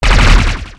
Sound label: vigor